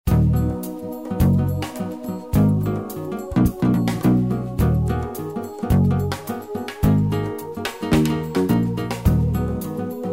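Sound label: Ab min Pedal, Ear Training; Ab minor Pedal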